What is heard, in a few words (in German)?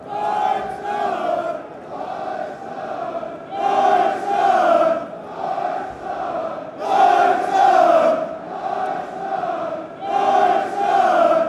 Deutschland Deutschland call, fanchants